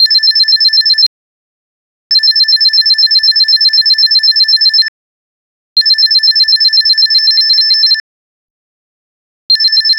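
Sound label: beep when the stock market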